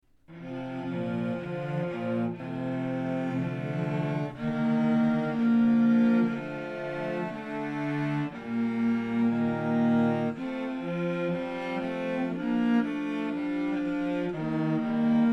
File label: Etude for 2 Cellos